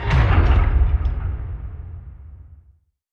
submarines engines

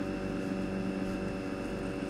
turret turn servo v, tanks; War Thunder

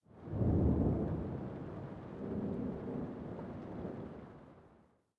thunder light; Rust

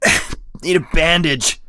bleed in; Escape from Tarkov

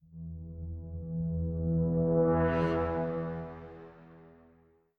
music interactive; World of Tanks

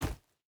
footstep burlap forest jump land; Rust

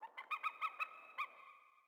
sting eagle; Rust

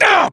melee attack; english

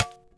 Staff Dirt; Civ4 warlords

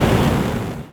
fire pipe burst loop end; Explosions

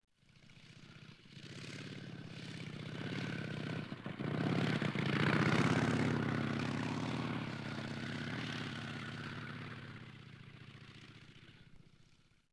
auto passing; Ambient; Civ4 warlords

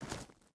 footstep hide gravel walk; Rust

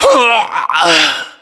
death; ModernWarfare; localized english

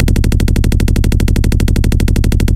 loop hispano suiza 30mm bass

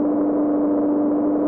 Aircrafts Gear In; DCS

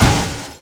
car door impact

